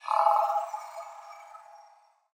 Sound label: Crawler Idle